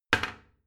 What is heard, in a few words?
toilet seat slam regular; haus-garten; badezimmer